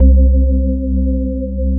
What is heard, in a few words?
ORGAN C2, HOUSE-ORGAN; Best Service Dream Experience